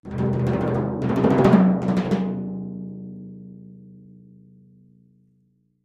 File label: Timpani Hands Roll; mallets timpani